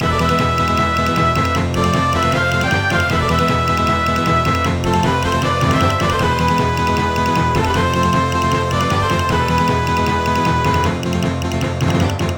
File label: Bgm skill raspberry; cookie run